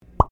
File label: lead popup alert